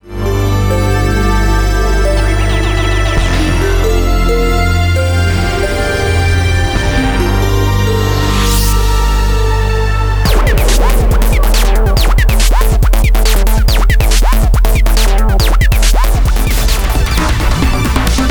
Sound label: Trailer Score